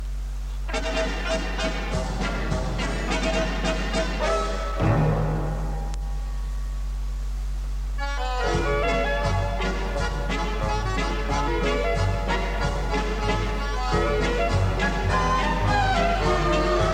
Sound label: Polka